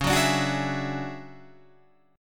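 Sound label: D Major Sharp 11th D flat, chord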